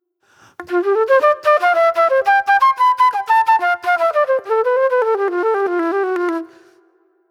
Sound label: Old Soul Flute; Sonic Foundry (Sony Creative Software); Manhattan Flutes; Good Times